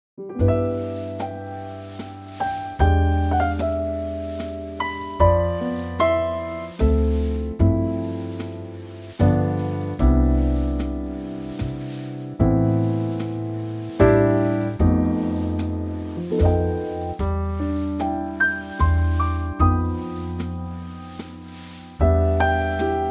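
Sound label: C trio, Backing Tracks for Male Vocals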